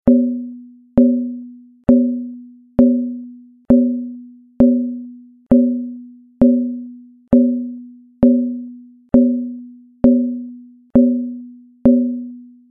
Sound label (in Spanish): Alarma Alien